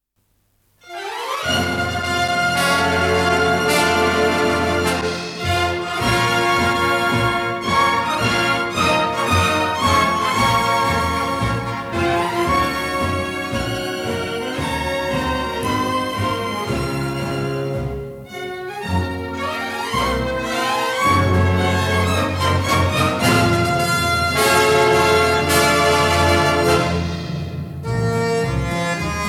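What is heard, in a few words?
march, variety orchestra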